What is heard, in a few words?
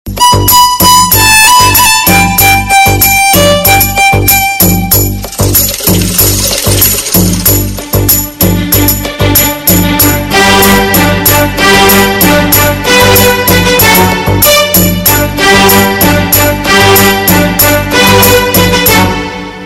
taal instrument